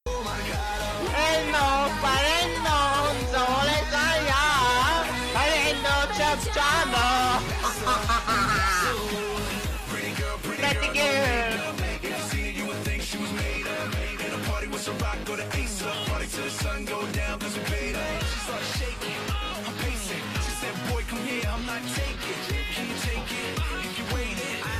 applause; osu skins; aristiarino

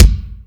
soft hit clap; New Zealand

